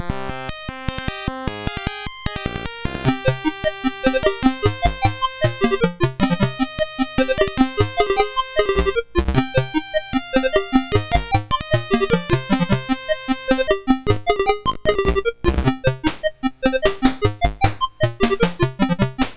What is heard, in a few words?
sid MOS6581R4; Movie Monster Game